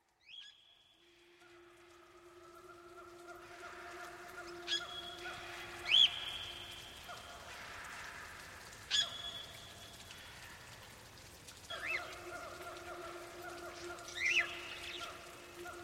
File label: Rain Forest Retreat, The Sounds Of Nature